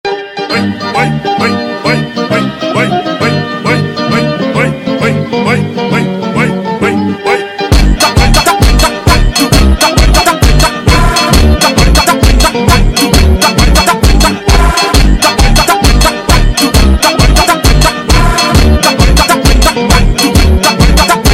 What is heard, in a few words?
Funk slowed